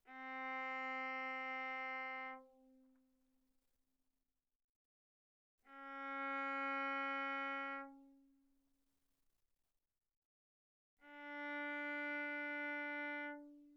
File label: Viola arco sul G mf C4 B4